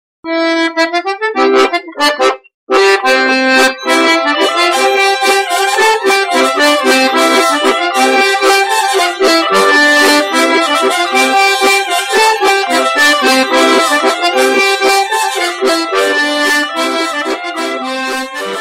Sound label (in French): Bourree d Aveyron france auvergne